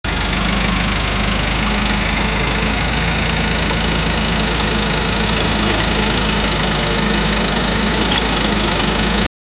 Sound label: tractor ki awaz, agriculture; tractor k chalny ki awaz